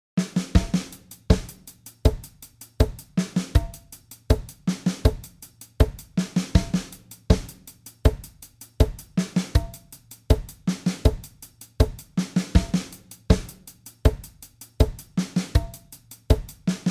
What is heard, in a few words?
Intro 100bpm